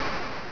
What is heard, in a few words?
enemy explode